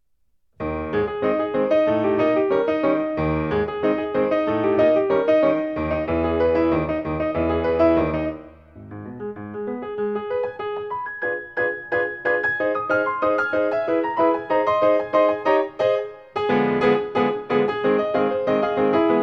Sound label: Classical Piano Solos, Maple Leaf Rag; Joplin